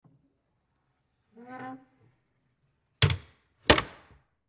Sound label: Door Cabinet